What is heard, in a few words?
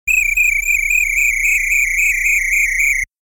whistling alert